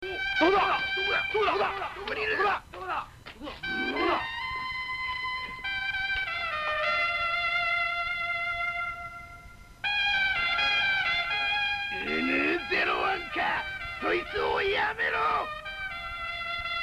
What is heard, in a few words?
trumpet; kotonoha